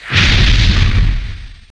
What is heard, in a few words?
dragon breath